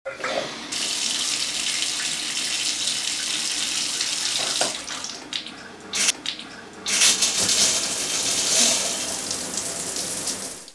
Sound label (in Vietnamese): Tieng nuoc voi hoa sen trong phong tam